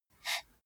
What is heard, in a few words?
computer mouse draw short